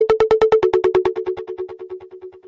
Sms

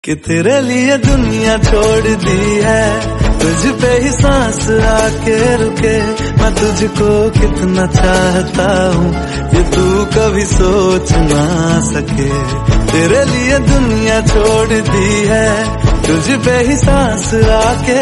song, ringtone

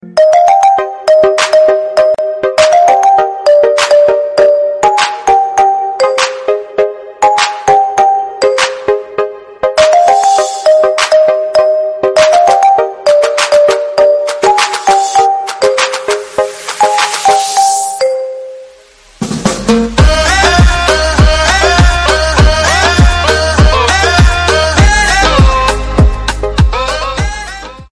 Instrument, ringtone

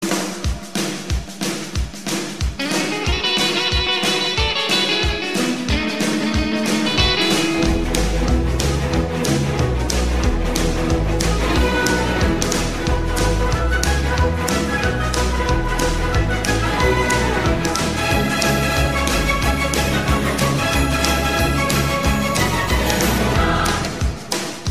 rock and roll

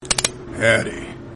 gun greeting

